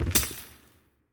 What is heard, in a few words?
Fall; Keepsake